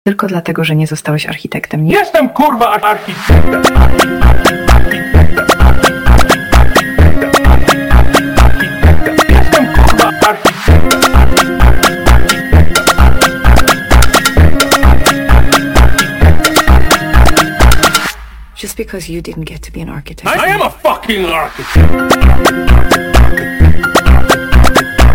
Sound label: Funk sped Up, funk